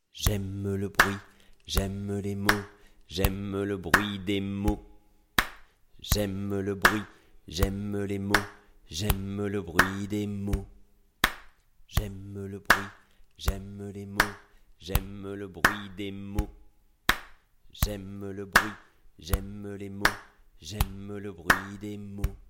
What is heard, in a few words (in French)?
Zip clac ostinato; mots en musique